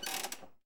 spin Button Up; colossal pinatas

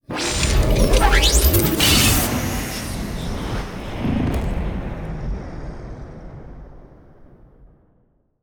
Evil Win; arcane

